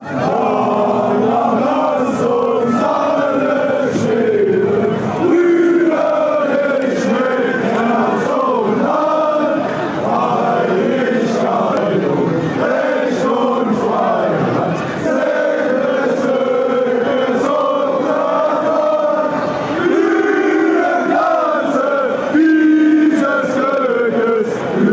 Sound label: national anthem, chants